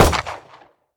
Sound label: Pistol Shot; Rogue-Like In C# Programing & Unity 2D Game Development 2022